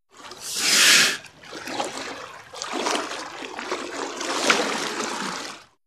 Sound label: Sea Lion Breaths. Breaths With Movement In Water. Close Perspective.; seals & sea lions